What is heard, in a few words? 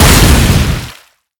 explode; brewblaster